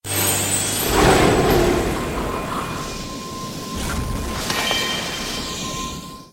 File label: chime; raising heart; nanoha